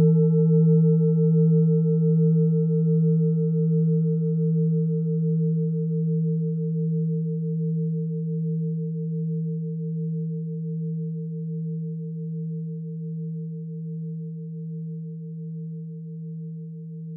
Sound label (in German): klangschale orissa